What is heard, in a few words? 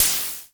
NOIZ, Dual Percussion Synthesiser; Klone